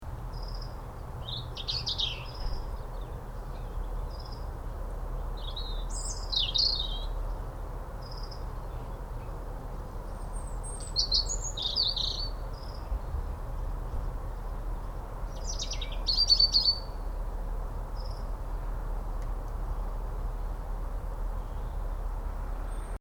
Garden (Residential): Ambience, Birds, Slight Road In Background; Outdoors; Backgrounds; Outdoor Sound Effects; Stuart Duffield